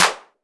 subtle reverb clap single hit f key, Royality free clap tuned to the F note; single hits one shots